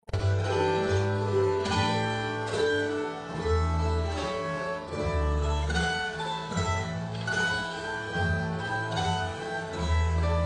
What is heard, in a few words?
Mando Slow; mandolin; Cluck Old Hen